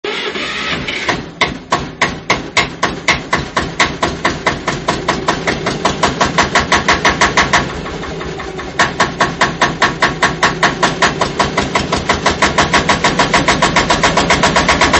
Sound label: porsche sound